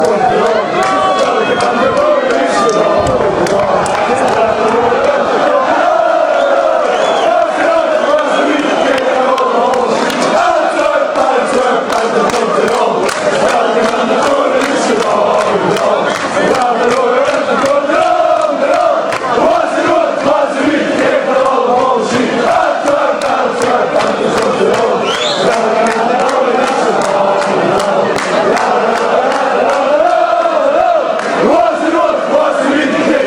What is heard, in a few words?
fanchants; antwerp is coming